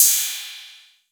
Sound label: PERC 808